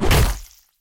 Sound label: 2x4 Hit Gore; Scrap Weapons; Zomboid